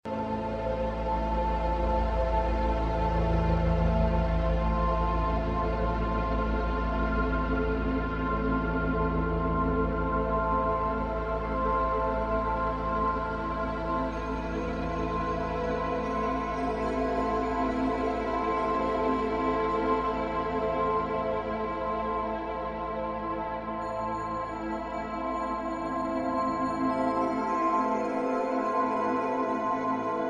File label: Shimmering Bells, Dramatic Ambience; Outer Space; Ambient SoundScapes